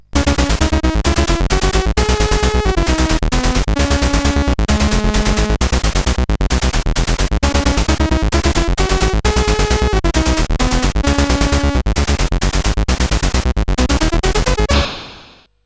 CSG8580R5, GAMES; Strike Fleet

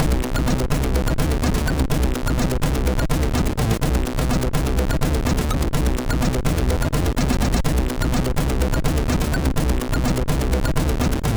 HardCore sid MOS6581R3

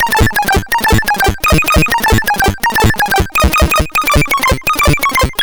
Arcade, sid CSG8580R5; Commando

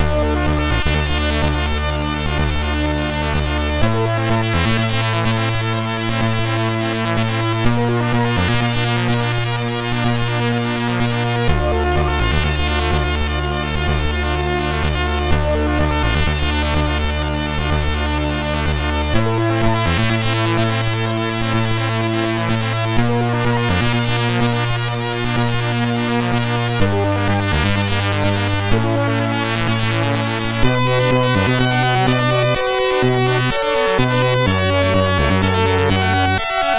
Flute, MOS6581R3

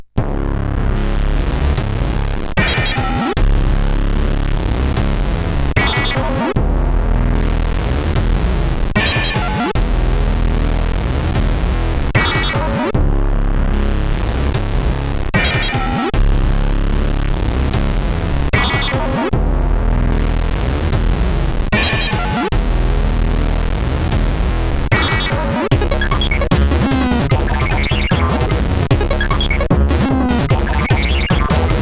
Packet Loss sid CSG8580R5

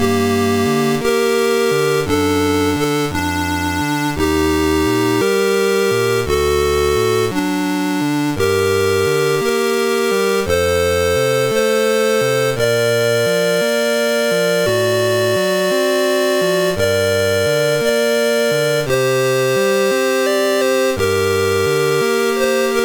Armenian Lullaby, MOS6581

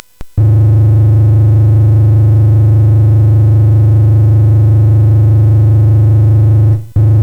Shuffle, CSG8580R5

